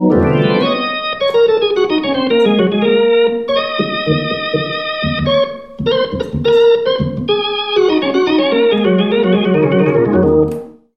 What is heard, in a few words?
B3 Fanfare, rotary amplifier speaker; MOON Amp